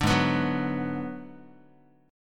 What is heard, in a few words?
Ab Minor 7th Flat 5th Ab, down, Guitar, 4A, 4E, 5A, 5D, 5G sharp, 6C sharp; strummed; JGuitar